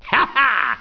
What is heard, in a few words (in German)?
lachen